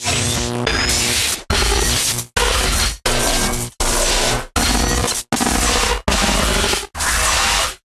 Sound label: lightning attractor charge; Factorio